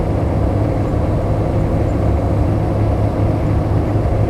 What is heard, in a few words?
MOTOR RPM 4X4; SIMUZENSOUND